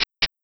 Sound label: sfx walk